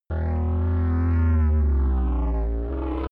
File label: low engine rumble